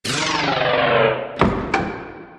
Assembly Plate Lift Down Rock Close; Boomtown; Titanfall 2

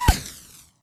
imp bullet lightballistic impactsweetener

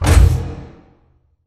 sliding door sm verb close stop; beacon; Titanfall 2

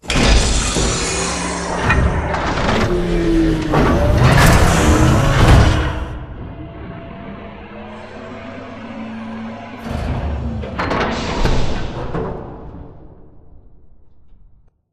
Robot Arm Right Cage Grab; Prop Warehouse; Titanfall 2; Boomtown

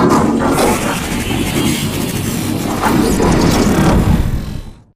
spectre 3d printing; Titanfall 2

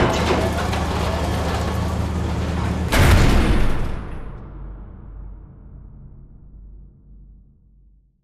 Vertical Elevator Sequence; Beacon; Titanfall 2

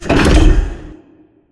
Center Tube Raise Stop; Titanfall 2; Boomtown

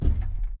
footstep jog; Titanfall 2

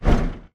bt footstep jog parts; Titanfall 2

